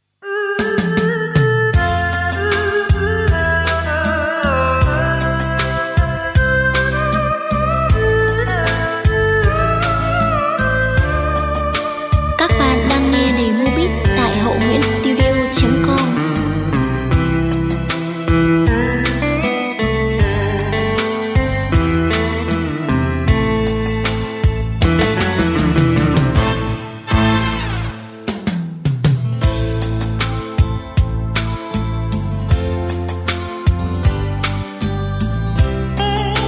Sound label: beat, playback